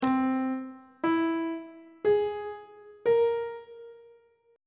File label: d double flat augmented 7th chord intervals; treble clef